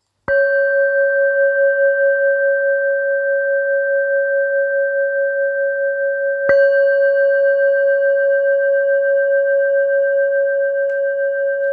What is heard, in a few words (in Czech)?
290 chopa, tibetska misa; tibetska misa choepa 290 g